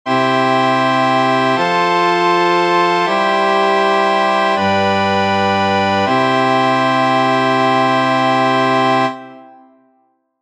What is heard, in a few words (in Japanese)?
密集配分のカデンツ